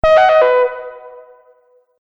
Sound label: Multimedia Event Notification, beep sound effect; Andrew Fleming